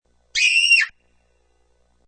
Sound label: Little Girl Scream, Single high-pitched girll's scream; Screaming; Voice